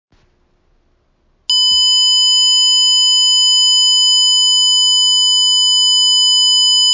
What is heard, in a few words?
1KHz